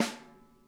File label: SNARE, Drum Kits